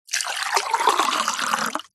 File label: food drink water pour into glass fast